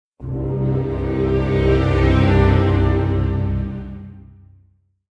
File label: Surrender Battle; heroes of might magic iii hd 2015 ios